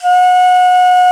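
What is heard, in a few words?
SOPRANO BANSURI; E-MU Producer Series Vol. 7 – Old World Instruments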